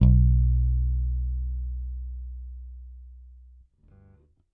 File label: Whole Note One Shot B, Bass; Bass X